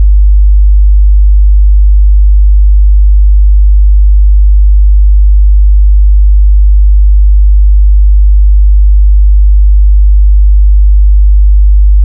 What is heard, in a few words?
Sine 50Hz 0dB